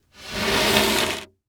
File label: Foley Movement Wood Slide On Cement Floor Short